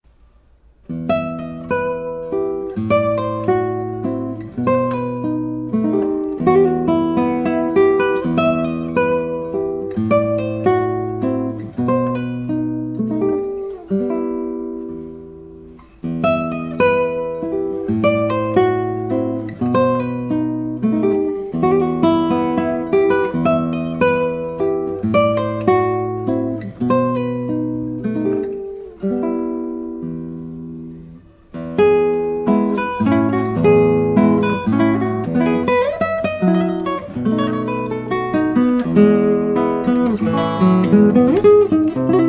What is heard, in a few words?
Adelita; Francisco Tarrega